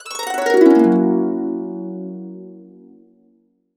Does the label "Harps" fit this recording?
Yes